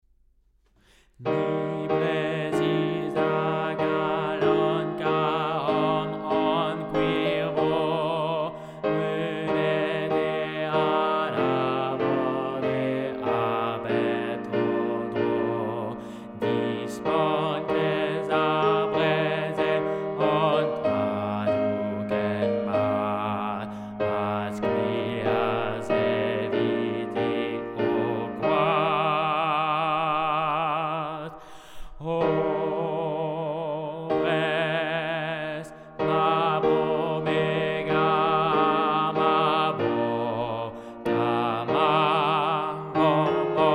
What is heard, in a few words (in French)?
basses; bro goz